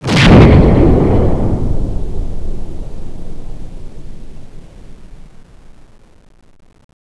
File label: jet; CSO Cronowing; cronobotics54